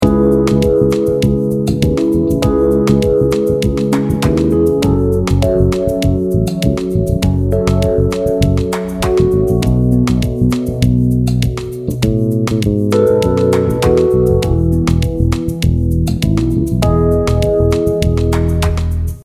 6251 E major, vi–ii–V–I in E (C#mi7–F#mi7–B7–Ema7) chord progression; bass; chord substitutions